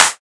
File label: Clap; Dubstep; Cymatics; Dubstep Starter Pack; Drums - One Shots